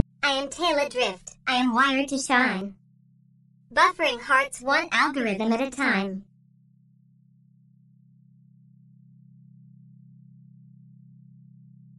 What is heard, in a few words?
singing